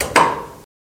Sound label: Gas Stove Burner Off; appliances